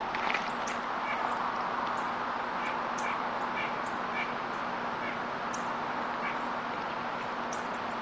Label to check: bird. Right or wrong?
Right